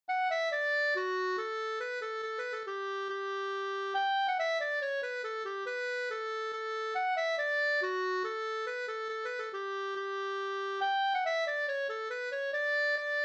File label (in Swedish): schottis; Fagersta